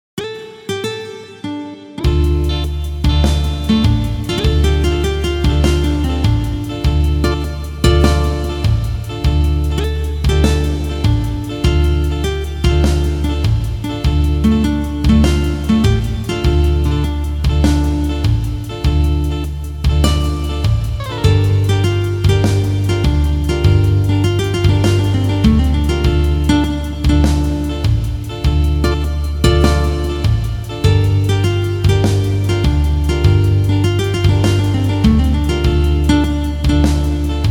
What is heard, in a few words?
Blues swing, guitares